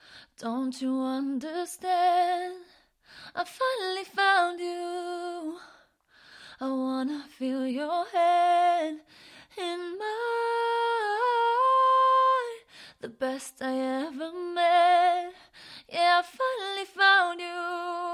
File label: pop rnb female 60 Fm